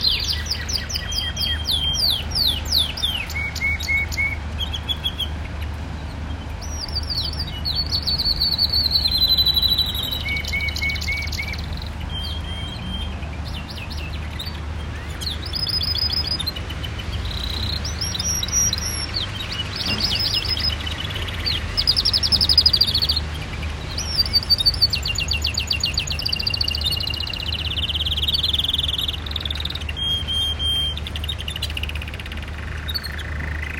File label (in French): oiseaux